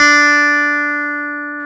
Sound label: Clavi D5; Sound & Vision; Gigapack I CD 2